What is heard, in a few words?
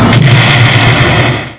EXPLODE; TREK105